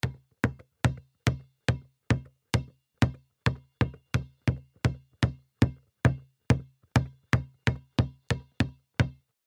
ball bounce multiple

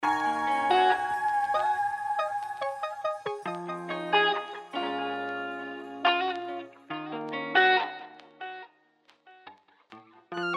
Frozen Lofi

